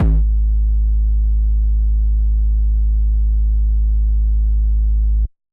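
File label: Bass Drum Jungle Long; Yamaha DJX-2B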